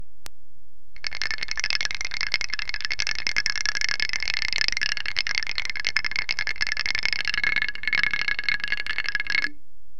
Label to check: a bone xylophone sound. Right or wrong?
Right